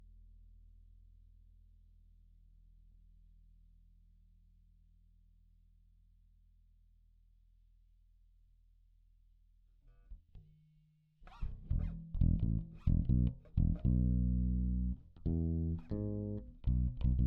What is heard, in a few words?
Bass DI